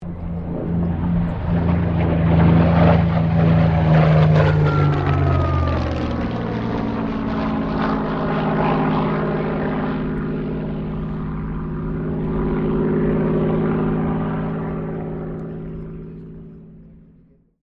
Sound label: AIRCRAFT SUPERMARINE SPITFIRE MK-XVI PASS